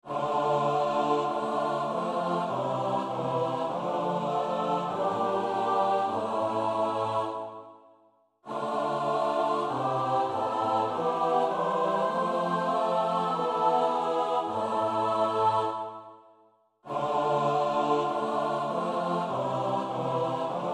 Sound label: Psalm 91 (S-A-T-B); Oefenbestanden; Claude Goudimel